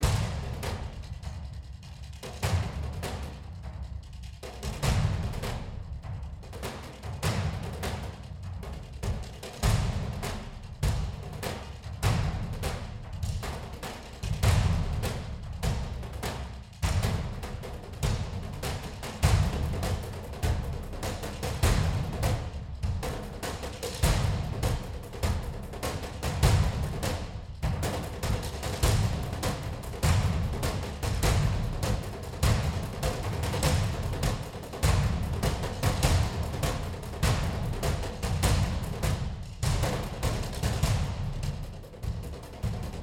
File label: drums